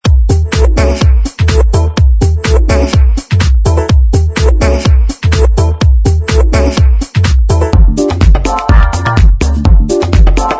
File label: royalty free music